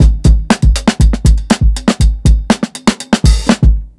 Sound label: 120 FUNKY, DRUMS LOOPS; BASEMENT DRUMS VOL 2